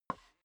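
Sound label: step boots wood creak slide short, footsteps; schritte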